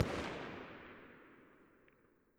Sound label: Fire; Weapon Package FortWars